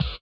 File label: slip slap; Spectrasonics; Metamorphosis